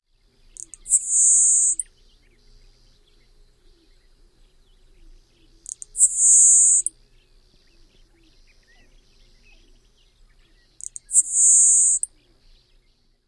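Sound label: typical songs, bird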